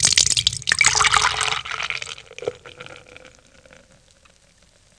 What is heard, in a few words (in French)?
biere, BAR AND PUB; East West; Global Sounds